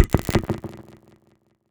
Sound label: misc oneshot, FX; GhostHack